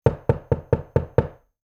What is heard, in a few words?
knocking